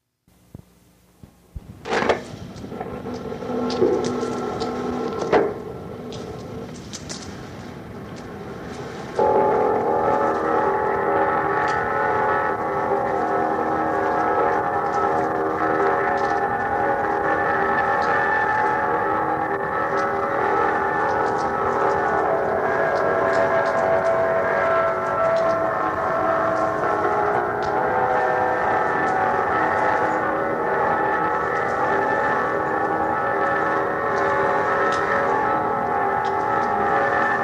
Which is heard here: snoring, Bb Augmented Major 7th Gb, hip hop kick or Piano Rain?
Piano Rain